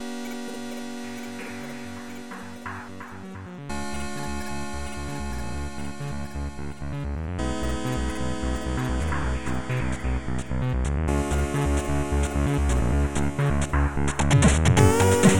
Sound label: chiptunes